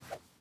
throw; teardown ps5 windows xbox series xs gamerip 2022

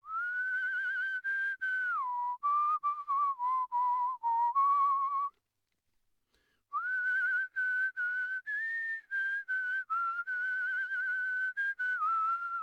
Whistle

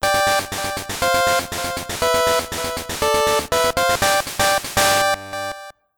Stage Clear; Solar Striker; GB

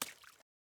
water step

